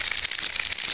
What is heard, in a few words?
scorp scamper; gearbox; lg scorp